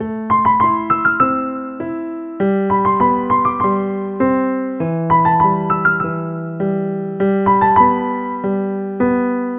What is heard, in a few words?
piano loop